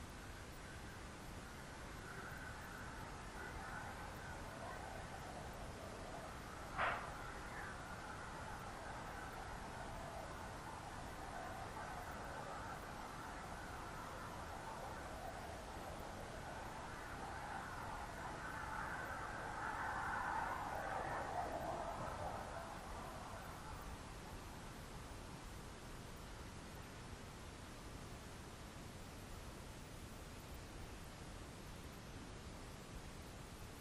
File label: Doll Room EVP Static; judson house investigation